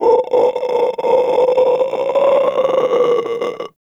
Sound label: Infested Runner Patrol; Warframe; Runners